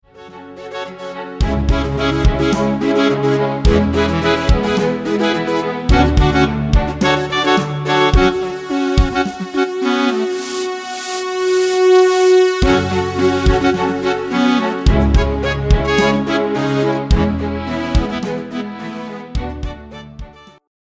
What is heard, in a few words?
solar sax; Chill out